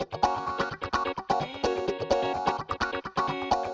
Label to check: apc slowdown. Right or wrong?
Wrong